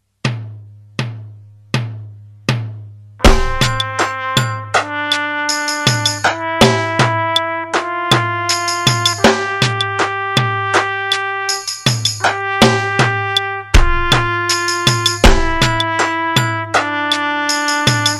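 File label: medium metronome; aerobics book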